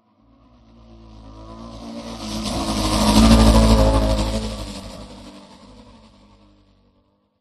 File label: Passby Designed Whoosh Futuristic Scifi Ripper Racer Glider Distorted Saturated; PMSFX